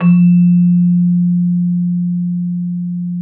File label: Celesta F3; Xsample VOL-8